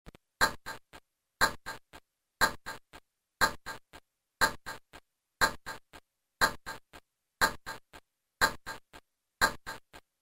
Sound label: Tick Tick